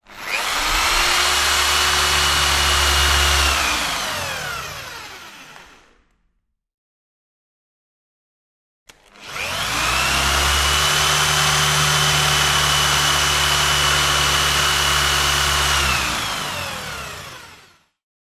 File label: Drill Run X2, hand drills